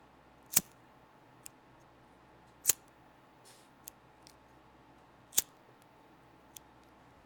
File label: Cigarette Lighter Sparking